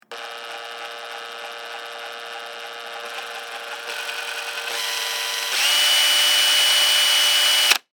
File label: Cordless Drill, Industry, Cordless, Drill, Dry, Burst, Slow, To, Fast; Drills